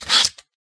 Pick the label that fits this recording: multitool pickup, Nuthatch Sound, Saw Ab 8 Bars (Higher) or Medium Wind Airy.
multitool pickup